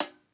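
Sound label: closed hi-hat; eddyflux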